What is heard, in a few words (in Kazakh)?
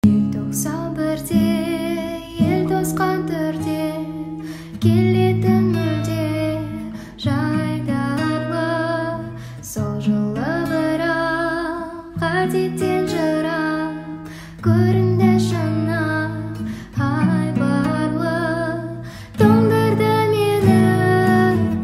gitara